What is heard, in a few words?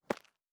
Dirt Walk Surface, Footsteps